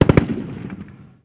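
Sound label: gun assault medium; Call of Duty Modern Warfare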